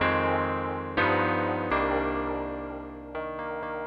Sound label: warm, Melody Loops; TRUTH-HZ; Crowdsourced Sample Pack